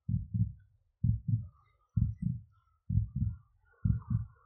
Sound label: low health; HLD